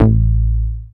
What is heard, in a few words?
Bass Long A; VEC1; Club; Long Basses